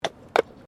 Tram Horse Drawn Horse Step Pavement

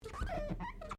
Glass Squeak Rubber

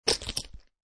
Poker Chip Piles Dropping On Playing Cards; Poker, gambling. Poker chip piles. Pile of poker chips dropping on playing cards

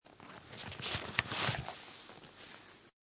Foley Leather Texture Slide; Slide Sound Effects; Slides (Sound Effects:Objects)

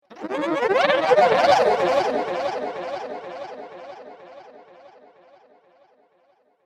Evolving Aliens, science fiction sound effect

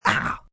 Pain Raspy Oh, Pain, male, raspy; VoiceBox

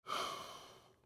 Human Blow On Hands Warm Up; Breathing; Blastwave

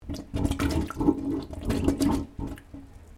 Water Gurgling In Pipe; Weather

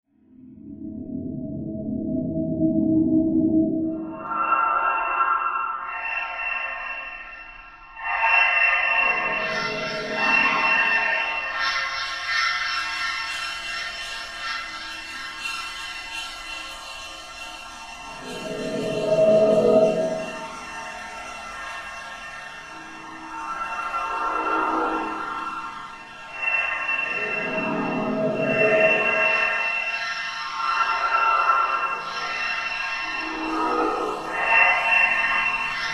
Bed Vox Pad Swells Airy Flange; Bed Sound Effects; Blastwave; Production Element Imaging Element Accent Transition

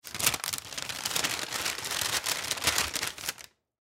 Paper Bag Open Crinkle Lunch Packaging Brown; Foley; Blastwave

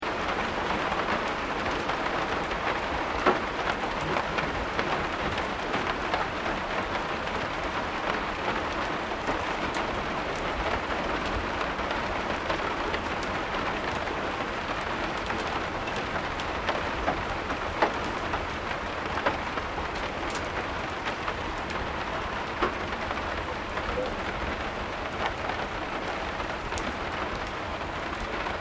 RAIN LIGHT WOODEN ROOF; Elemental weather effects; Rain (Sound Effects:Weather); SoundEffects-Foundation4

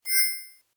Tech rollover, Rollover: Short, High Tech Effect; Mouseovers; Multimedia

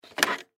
Telephone Pick Up; Office; Telephones; Electronics; Blastwave

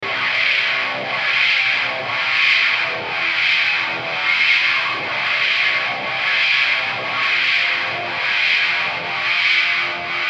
Musical Synth Dark Distortion Wah Delay; Synths; Blastwave; Sound Effects:Musical

